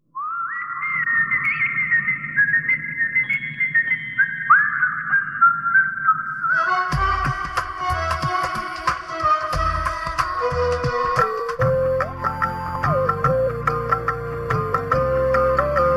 Advertisement Top Ringtone

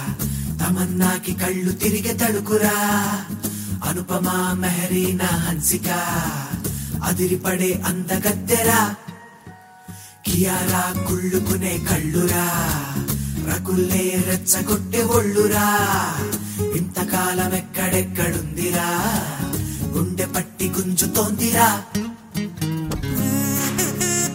New Telugu Ringtone

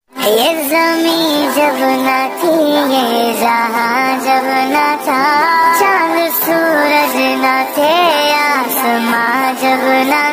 Naat Ramzan Mubarak

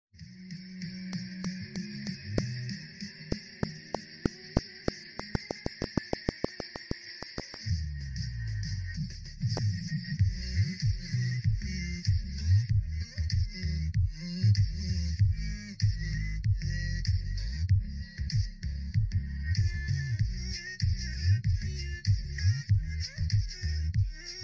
BGM Ringtone

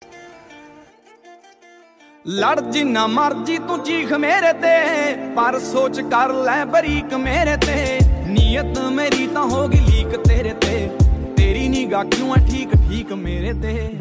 Punjabi Ringtone

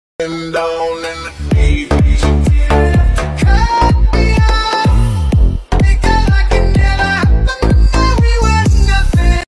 Fast English Music Ringtone

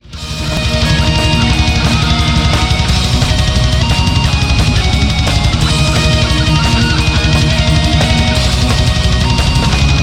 Go Go Power Ringtone

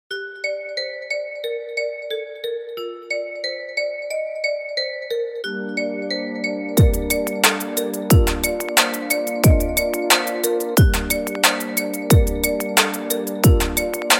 iPhone 12 Ringtone

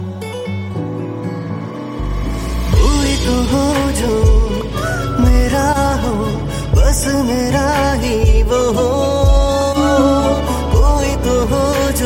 Punjabi Ringtone

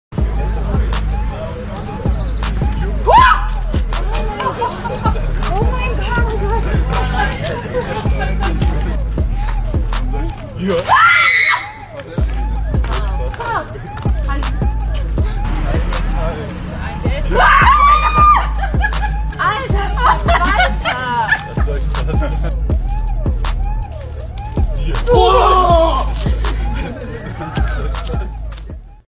Insane Screams Ringtone

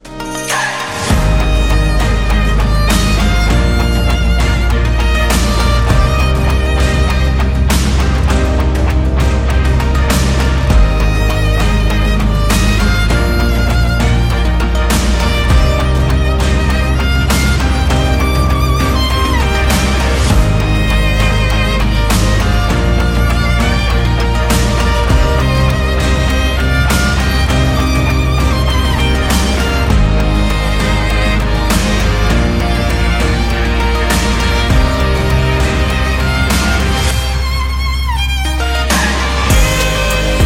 Samsung Ringtone; Over The Horizon

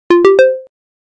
TCL Charging Sound

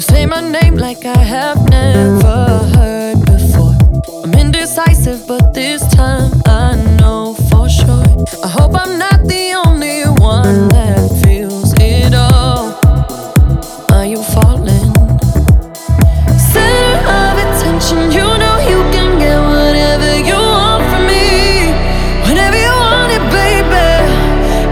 English Song Ringtone

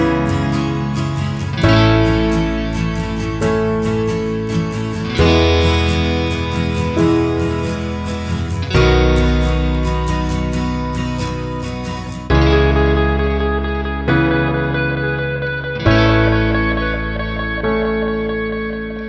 Light Guitar Ringtone; Oppo A12

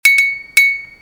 iPhone XS Sms Ringtone

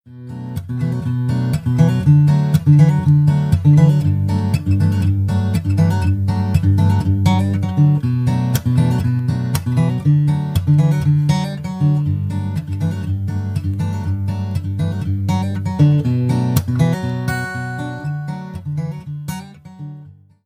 Guitar Ringtone Arrangement

